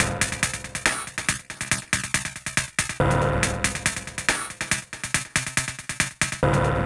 Machine Loop, Drums & Percussion, Electronic; Sonic Foundry (Sony Creative Software) - Electronic Point Blank

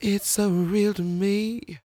croon, Vocal Planet Blues Soul; Spectrasonics

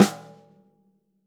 snare snares on; JazzFunkKit

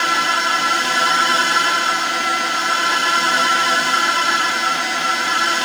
DISTORTIA; Spectrasonic Distorted Reality 2

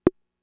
Conga, Fricke MFB-522

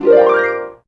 hp a#1, MIRAGE Harp Gliss + Solo; Retro Sampler

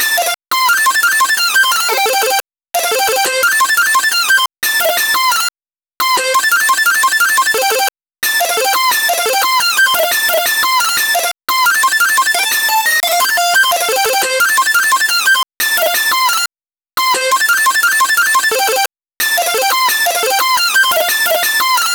lead

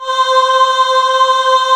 IN CHOIR, EMU; Rubber Chicken Software Sample Bank

